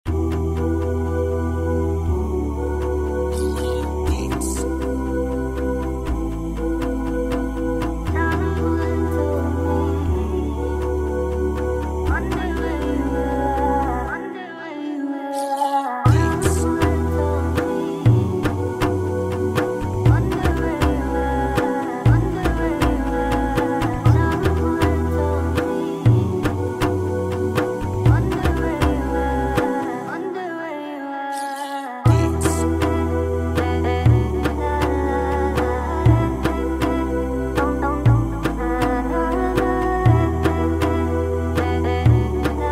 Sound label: nasheed background vocals drum; halalbeats